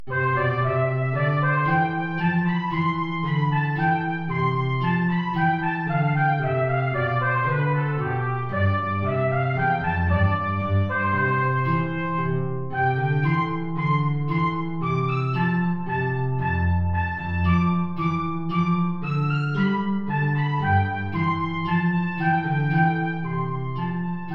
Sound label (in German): Air (Trompete in Bb & Gitarre); BWV 212-24